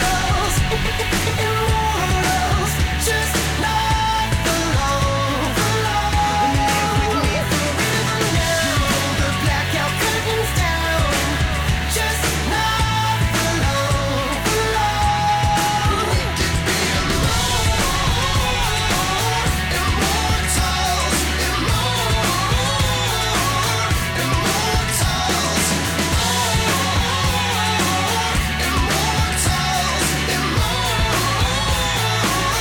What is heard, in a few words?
Loop; GodMode